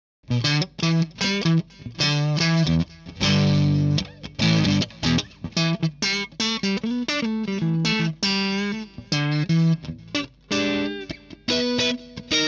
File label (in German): Hals Zerre